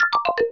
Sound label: Kick